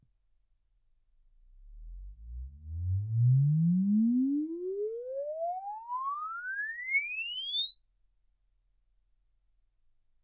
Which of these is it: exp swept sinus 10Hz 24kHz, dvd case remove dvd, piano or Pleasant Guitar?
exp swept sinus 10Hz 24kHz